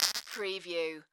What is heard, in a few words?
Squishy Fart